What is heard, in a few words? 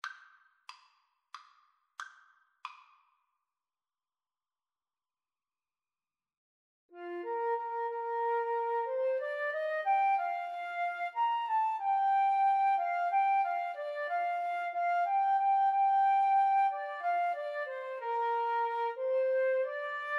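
2 flute karaoke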